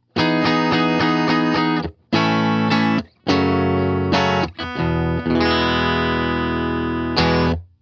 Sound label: Stratocaster bridge